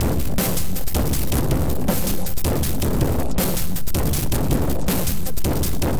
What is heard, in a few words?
Noise Beat; Drums & Drones